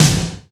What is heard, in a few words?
DANCE SET, DRUMS